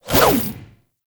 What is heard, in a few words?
Jump Whoosh; Enemies; Foley; Generic Foley; Warframe; Duviri; Dax